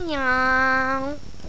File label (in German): miau; Netstory